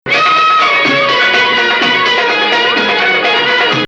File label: Bayati Ajam Nahawand, opening Ajam emphasis; Nahawand 4